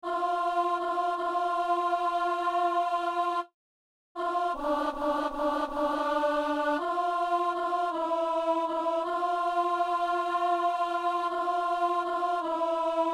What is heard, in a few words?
soprano; Messe coutrillonne - Kyrie